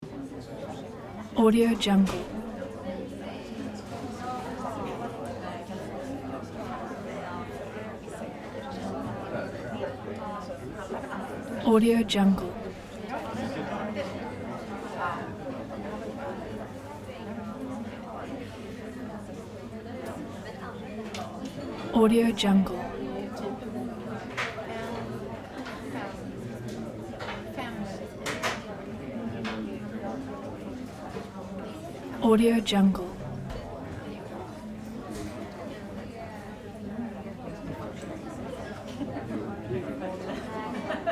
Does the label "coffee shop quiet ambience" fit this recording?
Yes